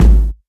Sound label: tight lows bass drum sound g sharp key, Royality free kickdrum tuned to the G# note